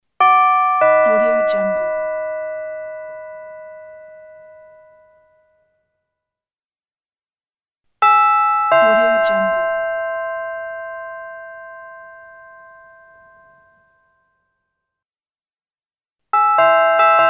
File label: doorbell